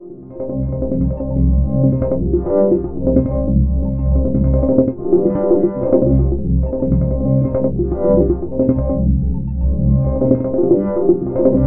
120 Noises Ambient Samples C MINORS

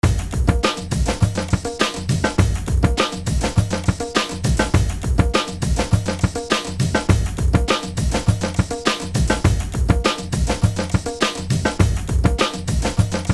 drums tabla 102 bpm

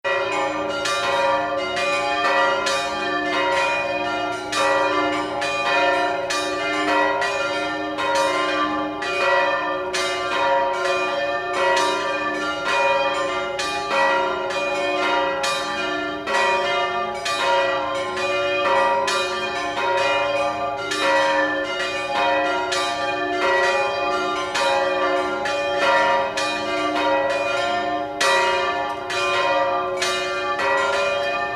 Bells and Birds

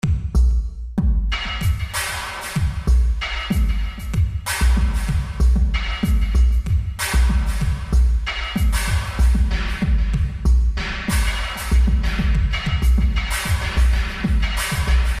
Industrial Drum Kit

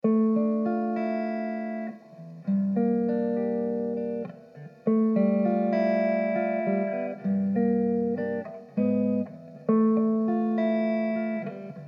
Dm100 Jazzy GTR chords

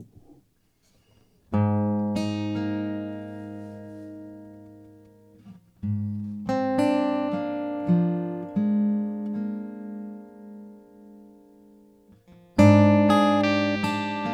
fair shades guitar

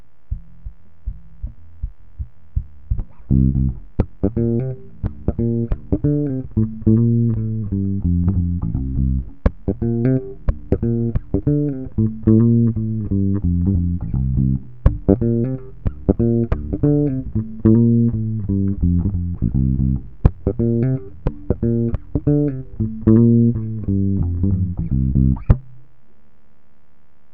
Bass idea

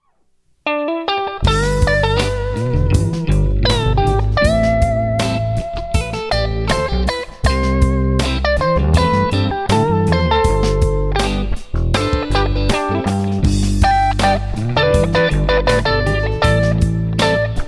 Goodtime Stratocaster; admiralbob77

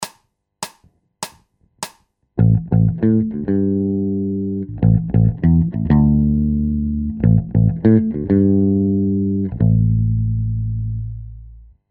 Bass Loops

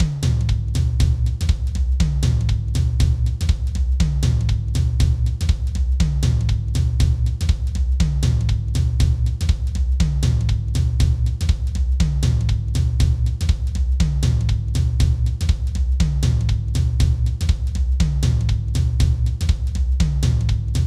Drums DRY 120 bpm

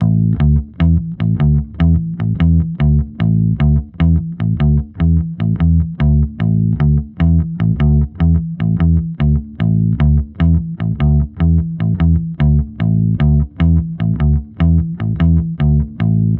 bass

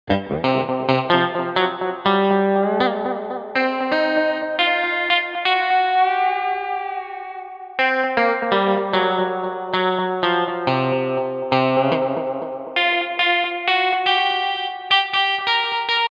Guitar

